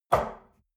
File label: espresso grab from table hard, restaurant cafe